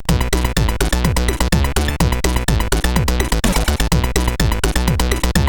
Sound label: Acieeed, CSG8580R5